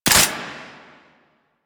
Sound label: AK47 Dry Fire; Warframe; Tenno; Weapons